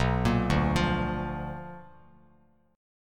B Minor Major 7th Flat 5th Bass Standard

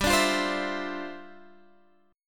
G 13th Suspended 4th G; strummed

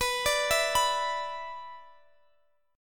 B-Minor Double Flat 5th, Guitar Open D

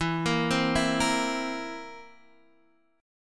A sharp Suspended 2nd Flat 5th E

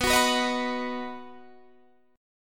B-Major B down Guitar Standard; strummed; capo=2

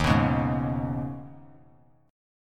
Eb 7th Suspended 4th Sharp 5th; Eb7sus4#5; strummed; 15,18,16,18; JGuitar

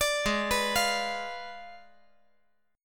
Ab Diminished 7th Ab, 5G, 4C, 5E, 5A; Abdim7 arpeggiated; JGuitar

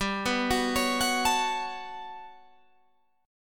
G Major Sharp 11th G down Guitar Open G

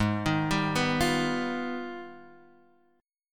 G sharp Minor Major 13th, Guitar Open G; x,1,1,0,0,3

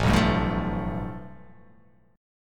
A 7th Suspended 2nd Sharp 5th

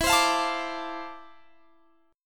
D-Minor 7th E, chord; Dm7/E; strummed

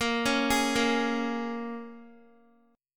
Bb Minor 7th Bb, Guitar; Bbm7 arpeggiated

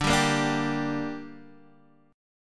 D Suspended 4th Sharp 5th, Guitar, Drop D

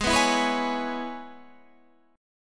C sharp Minor 7th; Gsharp; 16,14,14,16,14,16

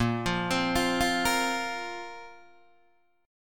Bb Suspended 2nd, Guitar, Open D

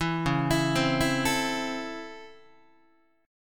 Db Minor Major 7th Sharp 5th, Guitar; 7,9,7,10,8,8; 4A,4E,5A,5D,5G#,6C#; arpeggiated

